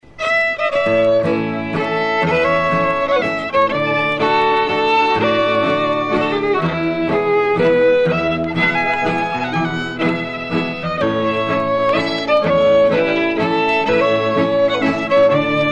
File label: Waltz